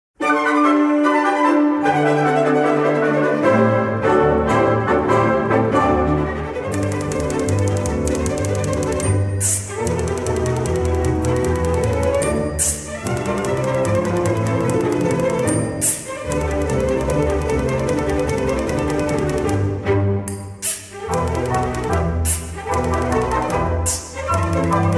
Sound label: The Typewriter